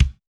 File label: kick; pasific; Pop